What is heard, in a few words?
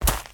footsteps; smite; god merlin